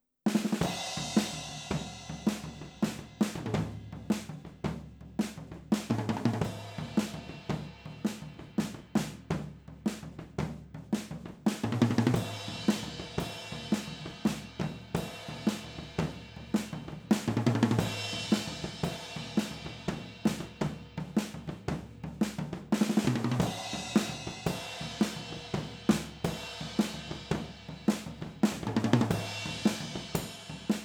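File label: Overhead stereo Drums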